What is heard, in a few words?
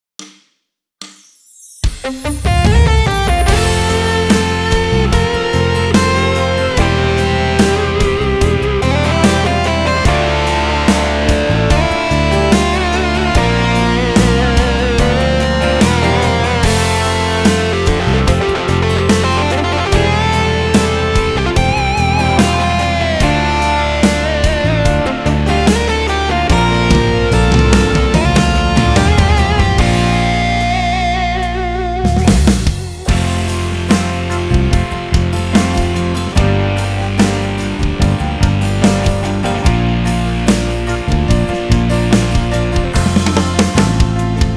Rock Ballad